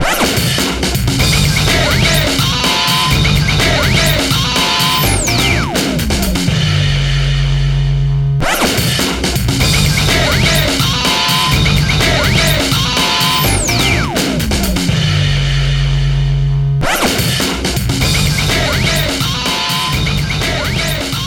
Game Clear; Sega Superstars Tennis; Jet Set Groove#3; Jet Set Radio Future

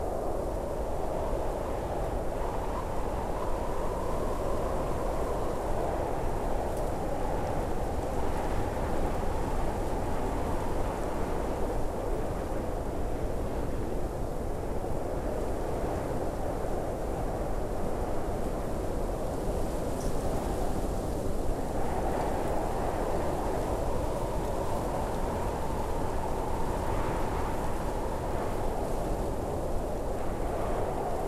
Ambient Cold Wind Loop

